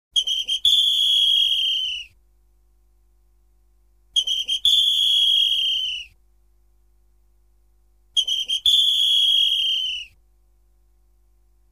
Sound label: apito